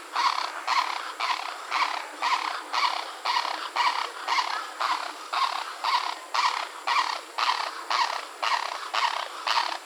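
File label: Phoenicopterus roseus juvenile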